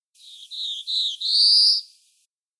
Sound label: Black throated Blue Type A1